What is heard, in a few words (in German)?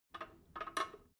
drain valve lever handle regular, Badezimmer; haus garten